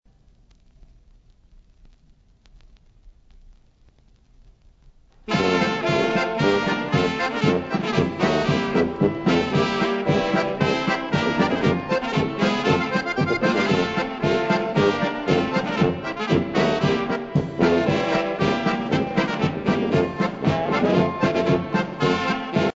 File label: polka